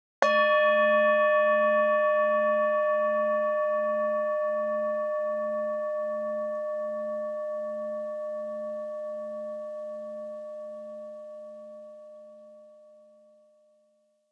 Tibetan bell, alarms; Huawei; U8160 Vodafone 858 Smart

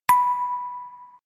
Hand Bell, notifications; Alcatel OT-903

